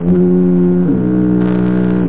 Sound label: Foghorn